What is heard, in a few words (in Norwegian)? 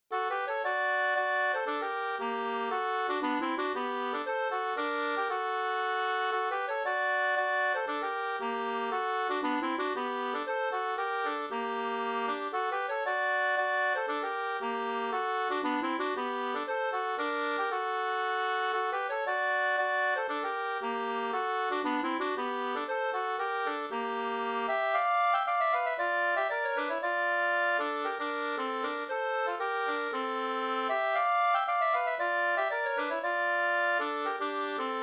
pols; Storjohannesleken no 2st